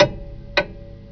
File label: Clock; Lara